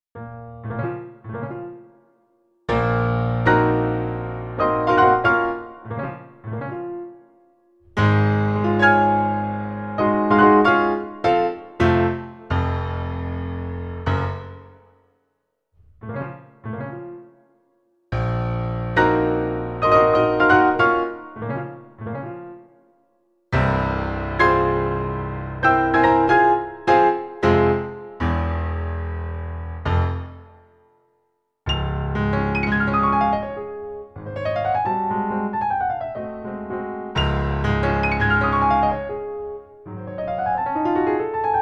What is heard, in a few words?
Piano